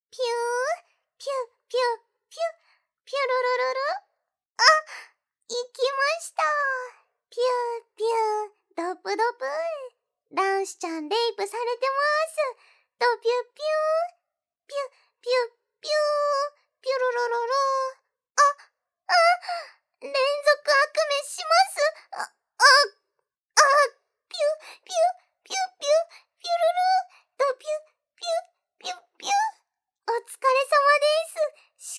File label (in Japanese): ノーマルマイク びゅ～～♥びゅっびゅっびゅっ♥